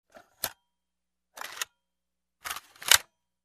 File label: Removing a film from a camera